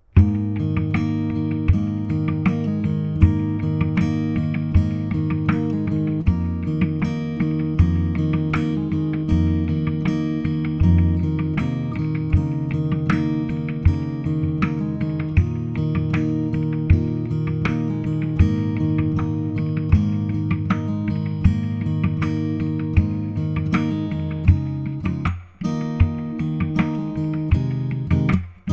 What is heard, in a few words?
offvocal